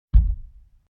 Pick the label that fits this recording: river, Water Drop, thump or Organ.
thump